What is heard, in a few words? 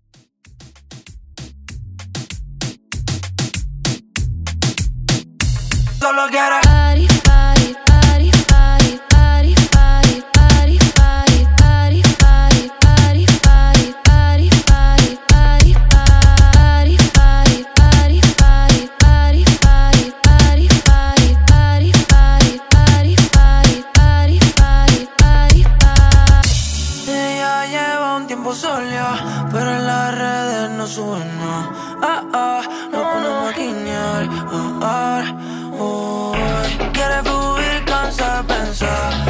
Reggaeton Mixshow Edit